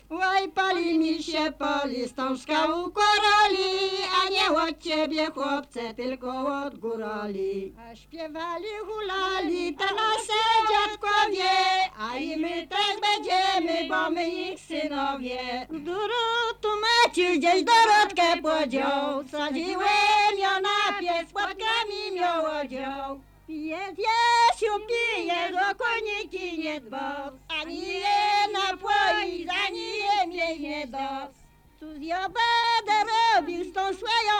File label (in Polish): przyśpiewki